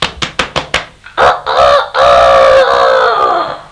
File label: rooster; Little Red Riding Hood; Koch; Storybook